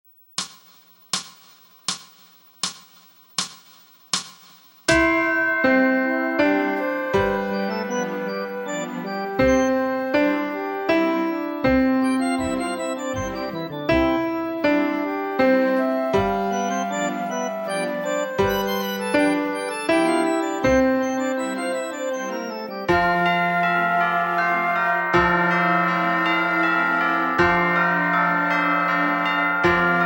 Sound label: Chimes; ensenando a tocar a los deditos